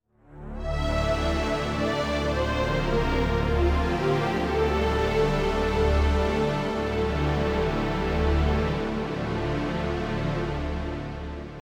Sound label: Funeral Game Over; Soukyuugurentai; Saturn